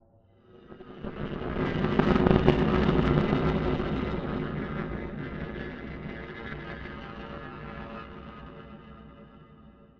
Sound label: FX 120 Metal Scrape C